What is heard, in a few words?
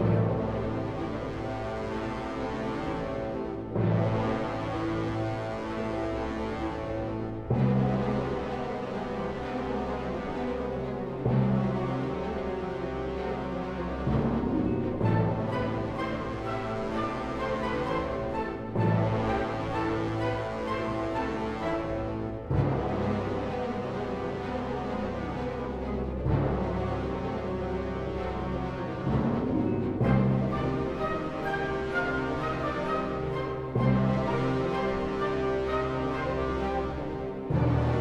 Dramatic 64bpm Loop; asterix obelix slap them all 2; Music Dialogue